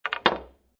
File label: Billiard Pool Snooker Put Cue On Table; Pool (Sound Effects:Sports)